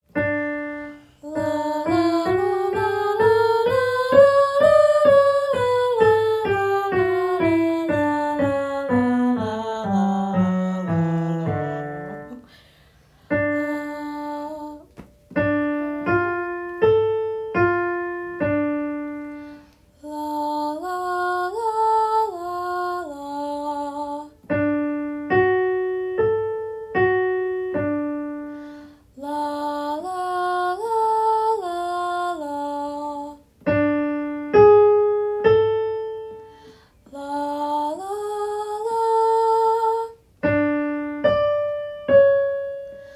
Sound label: soprano; Audition